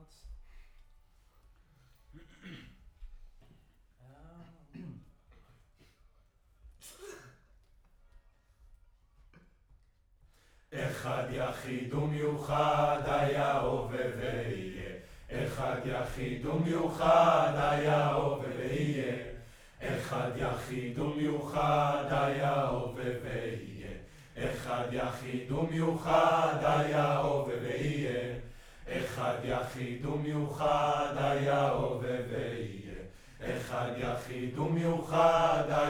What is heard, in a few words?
Choir Far